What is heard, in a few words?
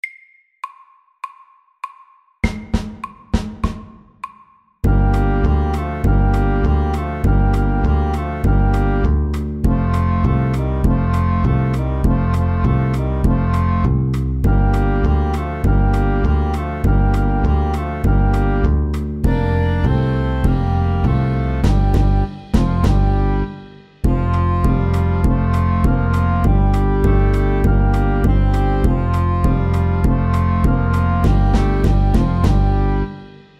Balkan stomp SLOW 100